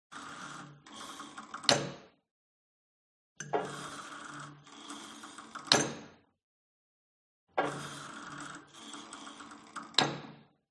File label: SPORT GYM Cable Machine Old Pull And Drop Sequence Hard; smartsound